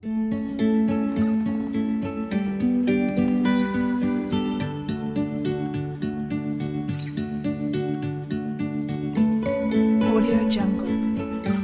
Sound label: discovery